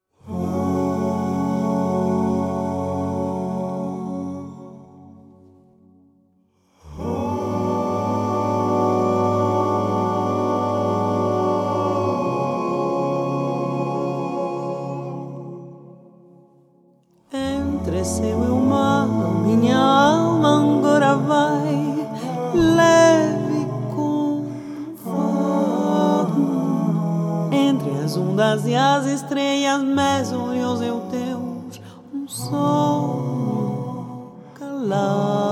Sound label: Fado song